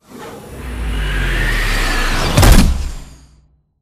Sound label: bt embark casual behind end; Titanfall 2